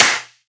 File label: Big Clap; Drums; One Shots; Claps; Cymatics; EDM Starter Pack